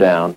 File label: LoFi Vocals Oneshots; Vengeance Samples